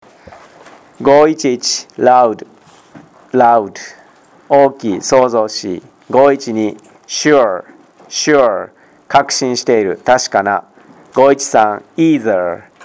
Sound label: tango